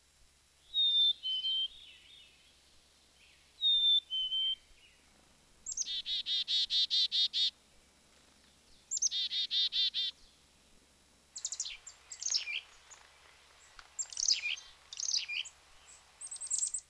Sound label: black-capped chickadee, chants